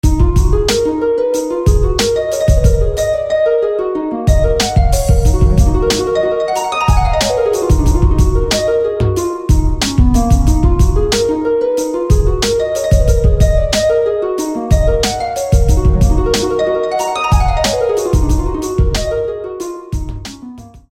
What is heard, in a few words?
Spring Harp; SFB FX